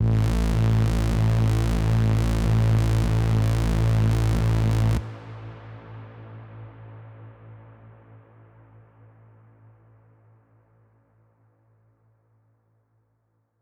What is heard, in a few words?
chip bass